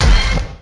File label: orchestra hit; Punches Hits Discoblasts; single hits